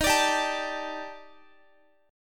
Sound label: D sharp Major Sharp 11th, Guitar; D#M#11; strummed